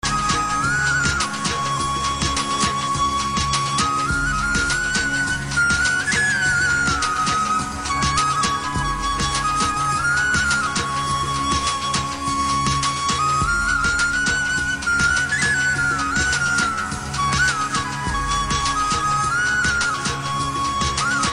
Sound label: flute